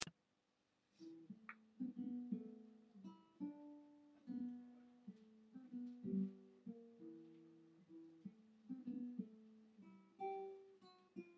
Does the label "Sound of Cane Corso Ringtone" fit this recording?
No